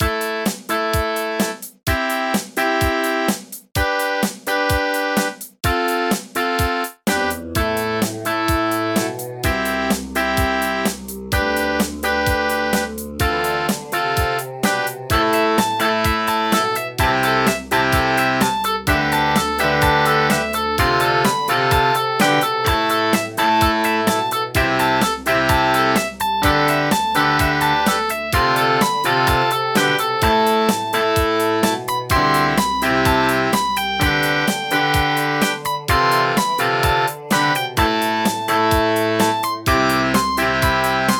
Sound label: Yamaha MU5; raptor call of the shadows